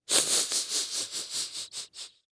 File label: Human Male Hissing Laugh